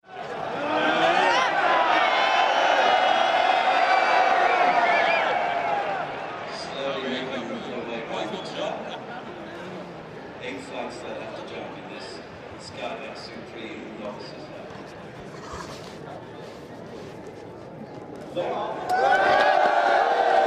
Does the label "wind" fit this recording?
No